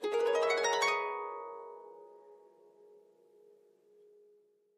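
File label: Harp Thin Short High Strings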